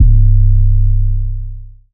808, C; Lofi; Cymatics; Lofi Starter Pack

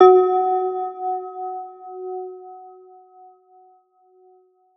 Riddim Melodic Hit - F# Cavern; One-Shots; Ghosthack; Riddim Essentials Volume 2

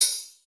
Open Hat (House); One Shots; Cymatics; DOPE DRUMS VOL. 1

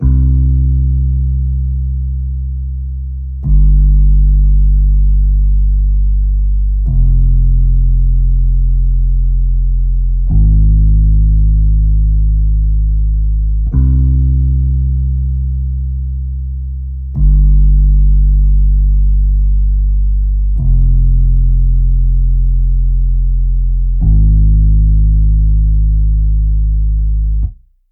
Bass - Cm 70BPM; STEMS; Ghosthack; SkyRealm; Mood Kits; Mood Kit 09 - Washed Ashore

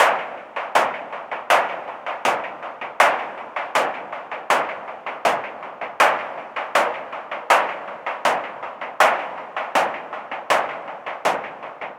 Perc Loop 80; Drums; Percussions; Loops; Abolish; Ghosthack; Drum Mega Bundle; Abstract Percussions Volume 4

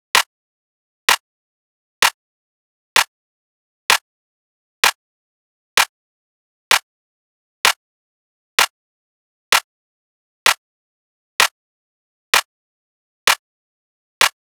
Drum Loop - 128 BPM Clap, Experimental; Drum Loops; Loop Stems; Cymatics - GHOST (Beta Pack); Abnormal Drum Loop

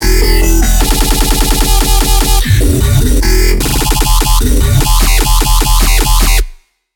150, Bass Loops, Dubstep; Chasing

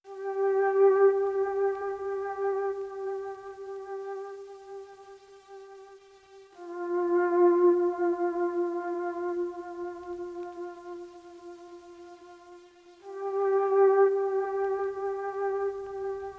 74 BPM C Min Flute; Lofi; Melodies; Loop Stems; Cymatics; Lofi Starter Pack; Summer Daze

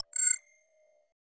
Glitch

